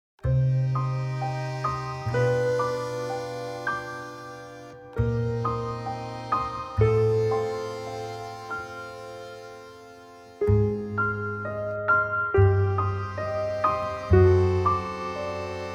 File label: Melancholic Mood